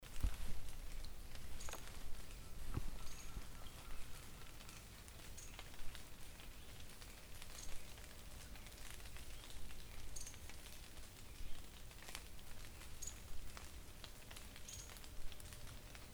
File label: Picumnus nebulosus calls; Colonia Garabi; Sto. Tome; Corrientes; Arg